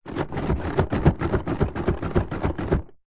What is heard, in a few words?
leisure board game shake box; telstrations